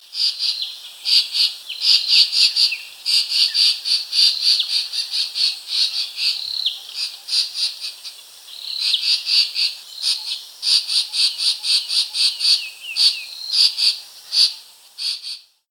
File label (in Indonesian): tangkar cetrong